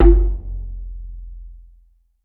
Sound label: GANGA LOG, FX PERCS; Crypton - DrumBase5000